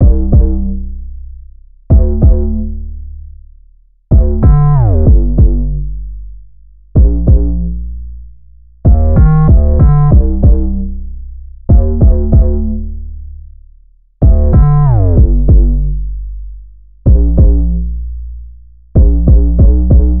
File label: Synth loop 95 Gm, Synth Bass Loops; Slate Digital BASSHOLE Sample Pack